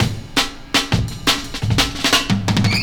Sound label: 170, Breaks; Drum&Bass; EZ Rollers